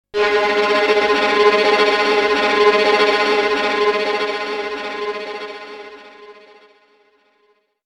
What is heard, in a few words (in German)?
Violine